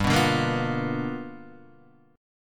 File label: G 7th Sharp 9th, G, chord; G7#9 strummed